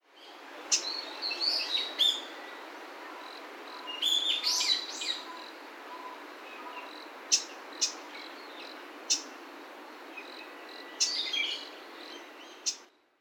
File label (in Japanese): ウグイス